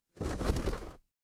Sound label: paper fabric fabric